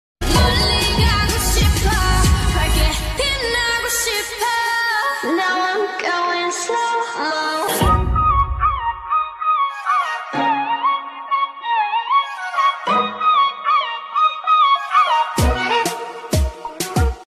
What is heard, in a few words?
Remix korean ringtone